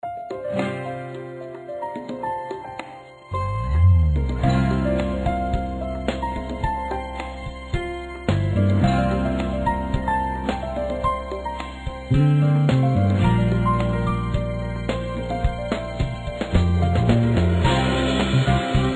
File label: welcome music